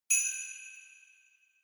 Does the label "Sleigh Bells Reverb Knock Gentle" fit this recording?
Yes